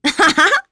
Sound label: Laugh; Nicky; kings raid; en